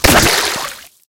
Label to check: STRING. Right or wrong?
Wrong